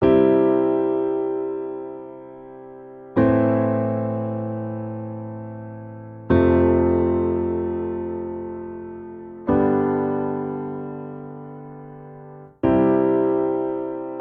Progression Pop Ballad I vi IV V C Major 76 bpm, Grand Piano; MIDI Clips; Ableton; Factory Packs